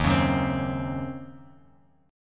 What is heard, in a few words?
D sharp Minor 13th D sharp Bass Standard, chord